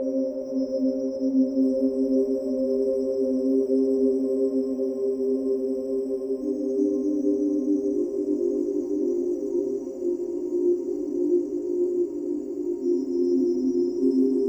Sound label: Sequence Dreamt Waves C 75; living air